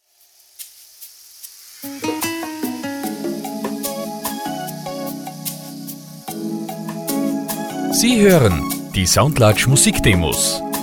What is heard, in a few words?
Slow